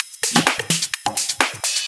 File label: Electro Loop 128 BPM; Vengeance Samples